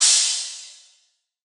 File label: Crash, Cymbals; Drum One Shots; Crashes; Cymatics; Eternity Sample Pack